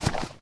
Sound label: default grass footstep; nalc game